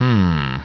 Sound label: humm; BattleSNDS